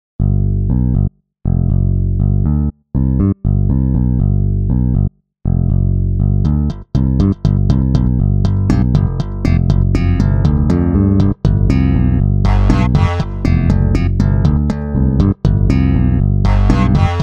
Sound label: many bass